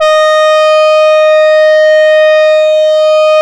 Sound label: REED, E-MU Producer Series Vol. 6 – Analogia Project, Strictly Synthe, Smoov Leadz